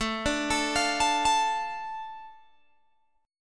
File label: A Suspended 4th Sharp 5th, Guitar Open G